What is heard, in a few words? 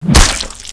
hit, zombie